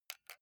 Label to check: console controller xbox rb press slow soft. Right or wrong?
Right